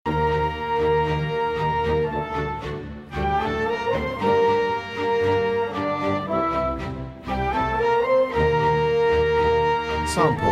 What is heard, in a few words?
classical